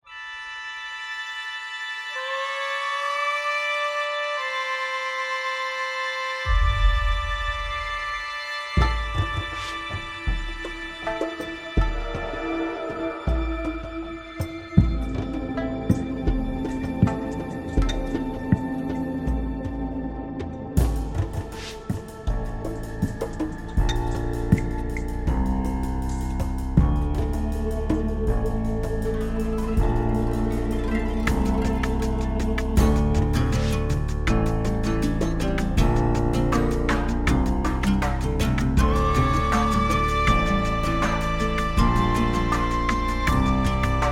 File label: Koto Beats